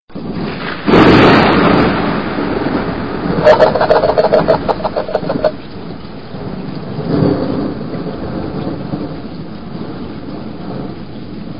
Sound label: Thunder Crash